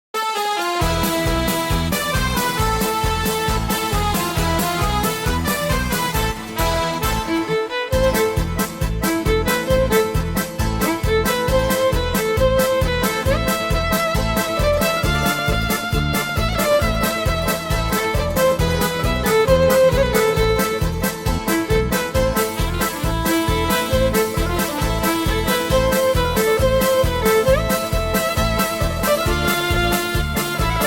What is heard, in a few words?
Bella Ciao Violin